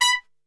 B 2 X PIC SHT, Brass; Best Service; Brass Super Section

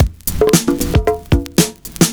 PERCS, 70 s Breakbeats; USB Soundscan vol.46; AKAI

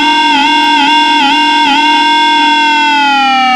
xtra lead (bender); Sonic Foundry (Sony Creative Software); Ugly Remnants VOL-2

